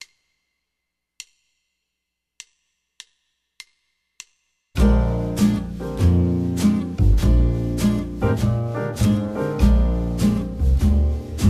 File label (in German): 4 takte c7 tenor, saxophone